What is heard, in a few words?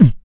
PERC, MALE PERC